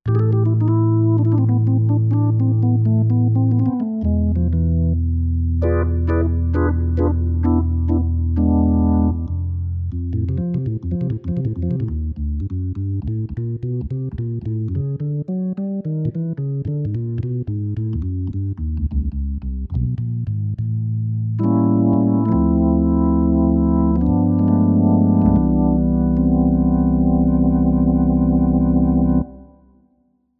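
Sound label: organ Left Manual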